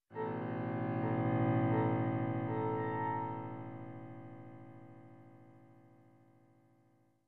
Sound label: Piano low key horror suspense sound effect